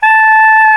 SAX SOP MP A, Soprano Sh; Roland LCDP07 Super Sax

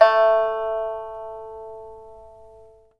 PIPA A4; Spectrasonics; Heart of Asia

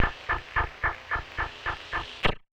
Casio, Glitched, malfunctioning synth

